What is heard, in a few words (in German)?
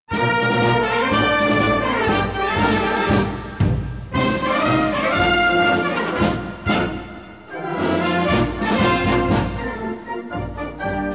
Marsch; deutsch; Fribourg